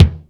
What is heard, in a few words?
full low k, Jazz; SN-R8-05 Jazz